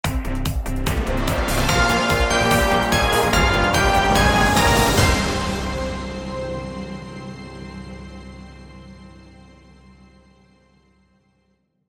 Hello New Punter Short; Millionaire Soundtrack; US Clock (2008)

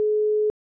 busy tone cept; i OS; iOS10; nano

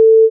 Soft Reset; braillenote; apex

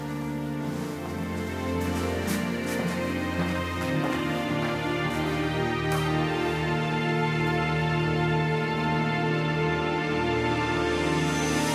Happy Ending Transition

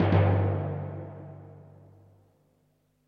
Two Drum Hits; Millionaire Soundtrack

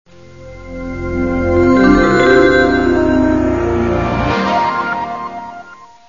Start, Windows; Whistler

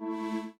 ringout; Windows; Glass

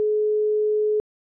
ringback tone cept; i OS; iOS10; nano

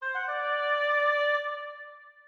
e-mail; Linux

